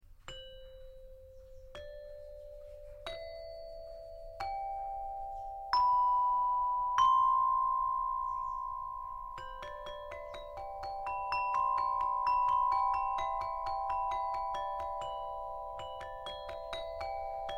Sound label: metallophone